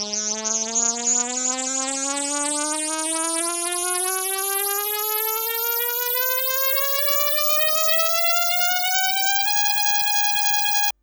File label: rise, fx & sweeps; EZRollers